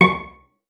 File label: CELLO FINGER; 90 s Sample CDs; Xsample VOL-5